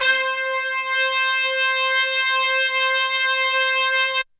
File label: HORNS C4